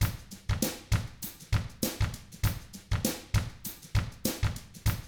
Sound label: FUNKY, Drums